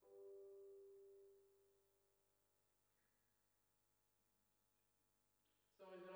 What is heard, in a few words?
Spring